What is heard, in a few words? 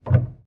piano release